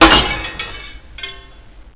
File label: glass, debris